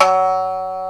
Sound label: shamisen F1#; AKAI S6000 CD-ROM - Volume 1